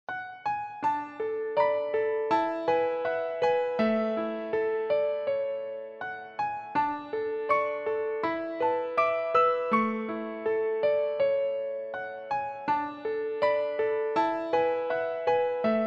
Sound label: piano